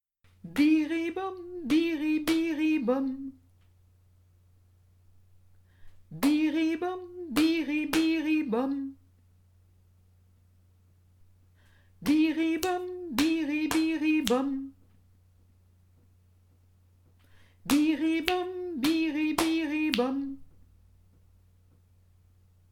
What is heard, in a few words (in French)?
apprentissage progressif en imitation chanté frappé